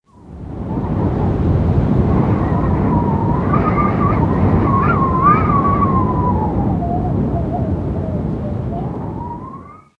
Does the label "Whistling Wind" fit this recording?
Yes